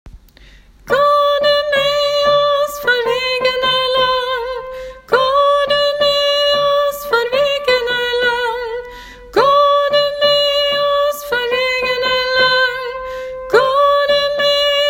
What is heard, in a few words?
tenor; hamba nahti; åfjord